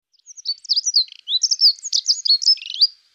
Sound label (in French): accenteur mouchet